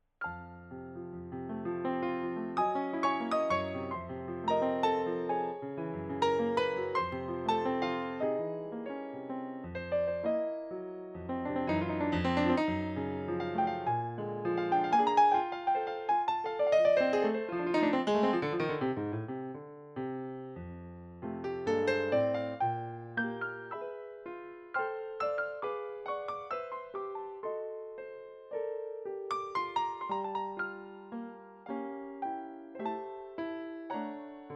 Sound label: Piano